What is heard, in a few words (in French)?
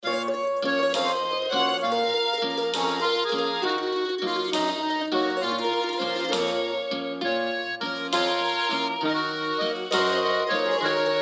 MEMORIES DANSE RETRO